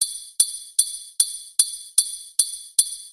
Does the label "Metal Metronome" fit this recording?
Yes